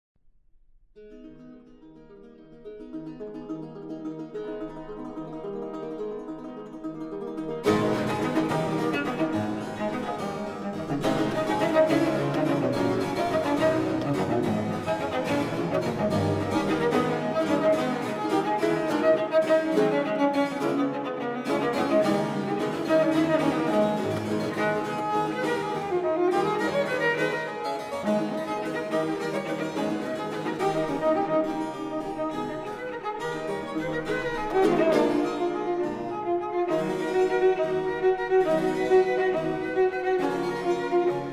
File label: Sonate a Violoncello Solo e Ba; CÓDICE MARTÍNEZ COMPAÑÓN